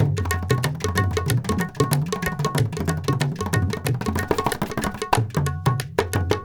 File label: India, Heart of Asia